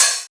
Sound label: Open Hihats, Cymbals